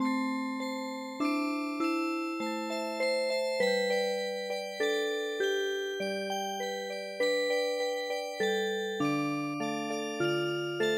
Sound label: pear tree Music Box